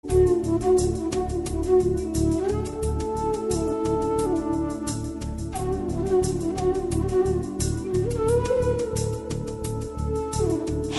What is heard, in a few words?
3d Flute